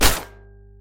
FSP9 Suppressor

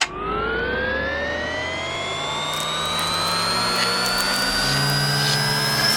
Spin Up

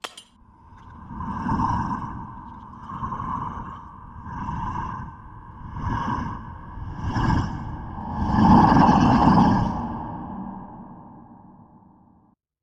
Light Bulb Drop

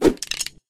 swing

